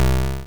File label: Dizzy Punch Single; Pokemon; Gen 1; attack moves; RBY